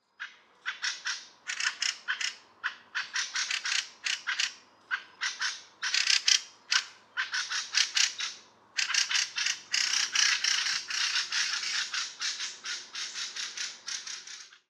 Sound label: Fieldfare